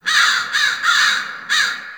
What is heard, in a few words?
Fall Crows; Warframe; Ambience; NineteenNinetyNine